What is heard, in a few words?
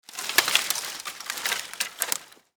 small branches moving and crunching